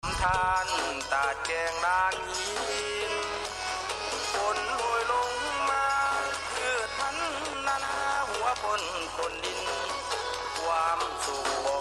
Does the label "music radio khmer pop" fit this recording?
Yes